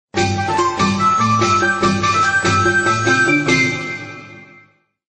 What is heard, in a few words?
Music Ex Win